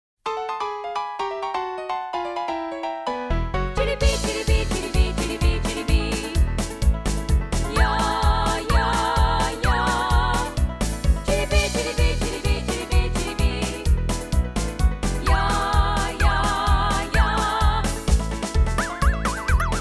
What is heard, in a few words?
ragtime medley with background vocal and flexitone